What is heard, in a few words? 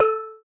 bell short; HeavenStudio; launch Party